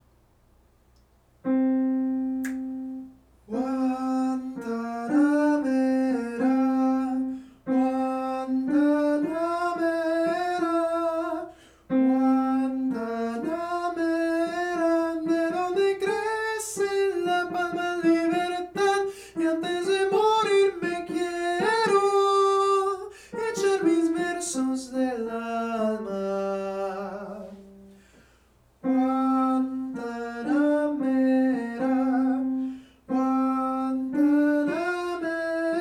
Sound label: soli alto